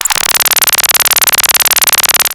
Laser Disrupter, Start, Fire, Stop; gun and weapon sounds; captain audio; fully loaded

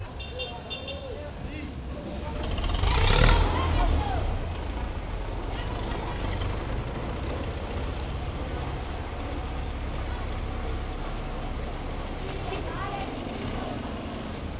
cars old cuba, street traffic; best service Blue Box

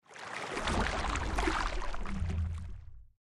Central Pool; Blind Quest - The Frost Demon

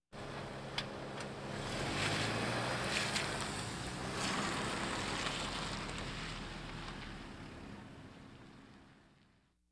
Automobiles various

